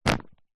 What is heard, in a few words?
household plastic pillow or duvet bag grab pick up